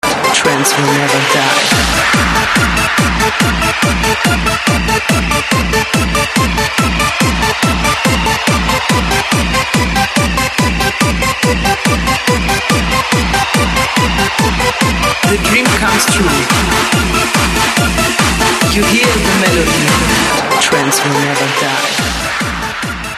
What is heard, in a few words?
techno